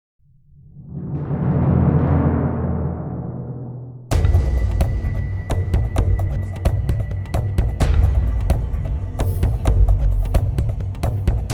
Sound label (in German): Getrommel weich